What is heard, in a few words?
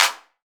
808 CLAPS; EdgeSounds; Drum Mashines; R-8 DRUMS